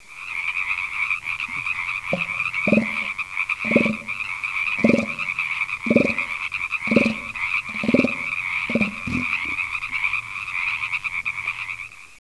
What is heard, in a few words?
bull frogs